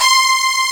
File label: STRG C4, Strings, POP STRINGS